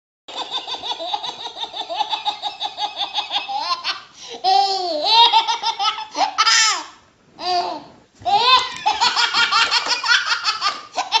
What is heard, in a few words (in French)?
sonnerie bebe mort de rire